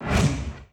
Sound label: ftl warp incoming ship; ambient; Stellaris